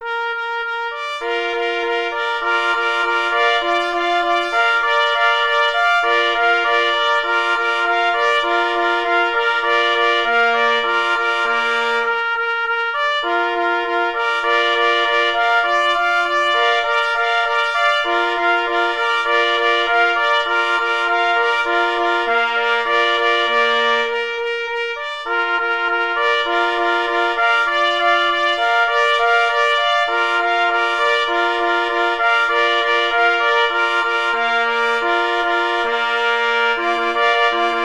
Kanon trzyglosowy na 3 plesy

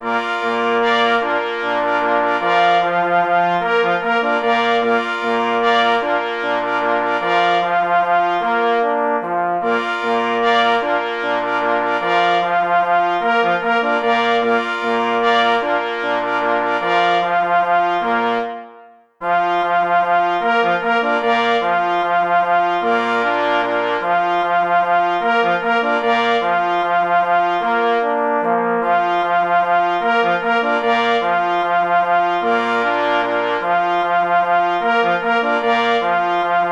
Walc na 2 plesy i 2 parforsy; K. Anbild; Walc pszczynski

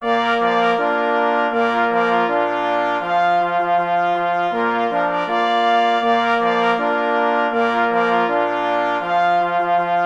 Marsz na 2 plesy i 2 parforsy; J. Rosner

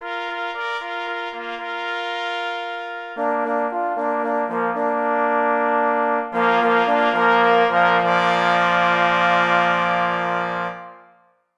Fanfara; St. Rajba; Fanfara I; powitalne fanfary stanislawa rajby

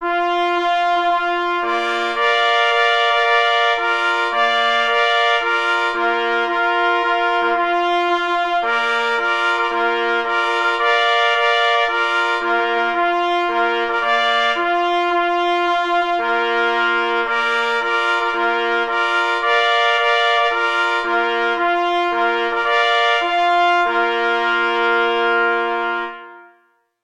Hejnal na 3 plesy; A. Kanawka; Hejnal Ksiecia pszczynskiego; Artur Kanawka (ur. 1970) – waltornista, dyrygent chóralny i orkiestrowy, kompozytor

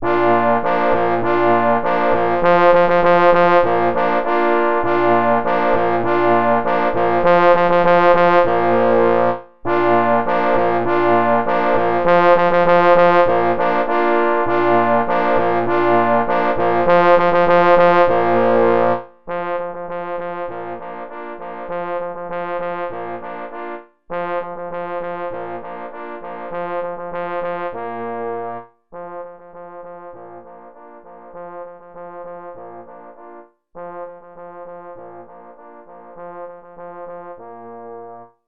na 3 parforsy; Posokowce gora

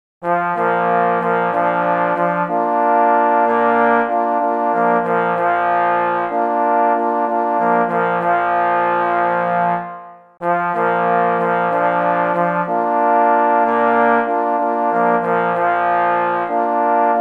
Fanfara na 3 parforsy; oprac. M. Kaminski; Darz Bor

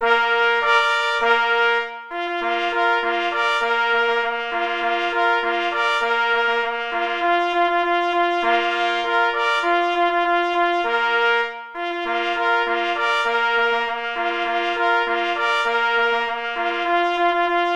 Marsz; J. Rosner

